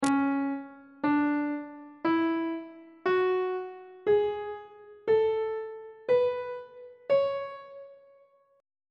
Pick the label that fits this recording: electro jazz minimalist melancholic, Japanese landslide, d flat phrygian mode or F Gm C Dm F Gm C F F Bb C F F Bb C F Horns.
d flat phrygian mode